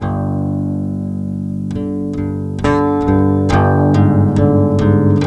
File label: Loop Spooky; carte blanche for a fistful of teeth; windows gamerip 2006